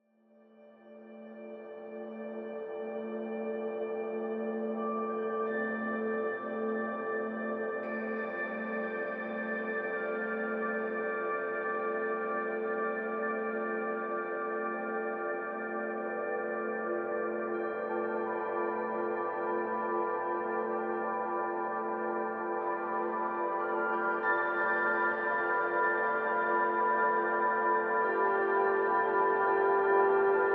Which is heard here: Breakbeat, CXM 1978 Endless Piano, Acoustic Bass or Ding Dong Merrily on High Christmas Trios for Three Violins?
CXM 1978 Endless Piano